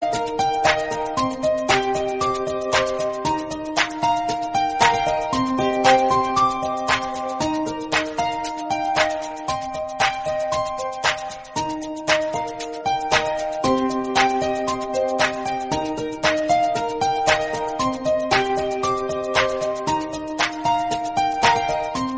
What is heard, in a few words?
simple ringtone